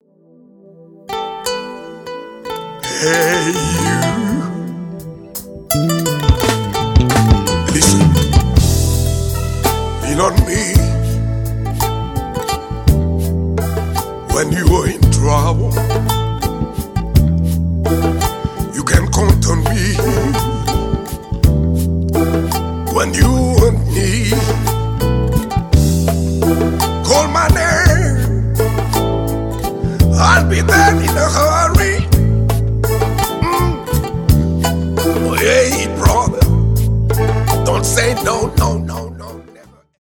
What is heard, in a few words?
kora